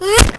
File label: fall; hamster